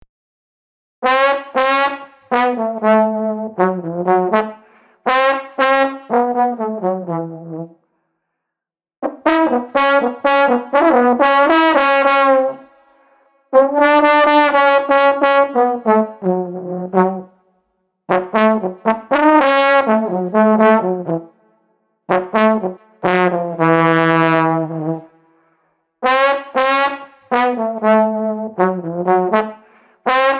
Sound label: Street Trombone Groovy 120